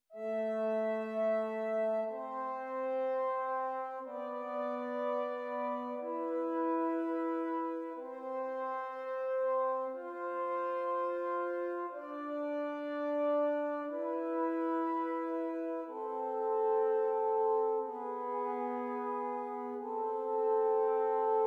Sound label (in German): Zwei simmiger Contrapunkt; Ronge